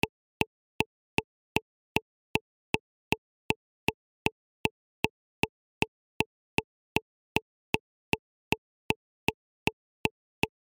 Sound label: presto